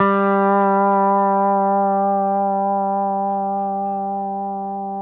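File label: pedal steel G3 left; PEDSTL NOTES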